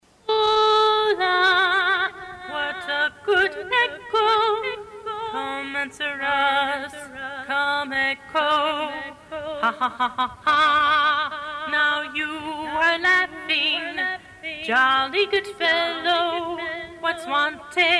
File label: The Echo Song, A Capella